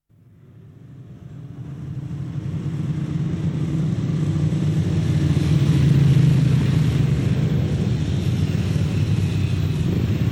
AUTO TRAFFICO

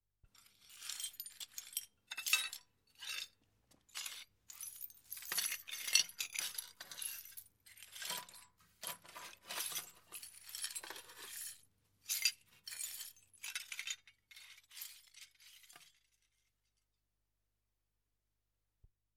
VETRI E COCCI SPAZZATI SCOPARE

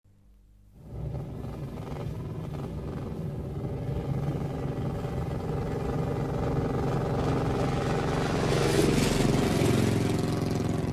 MOTOCICLETTA PASSAGGIO

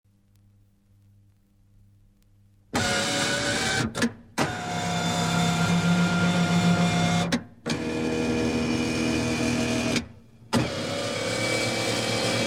ALZACRISTALLI ELETTRICO

